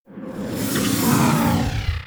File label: Portal Enter; Missions; Zariman; Zariman Exterminate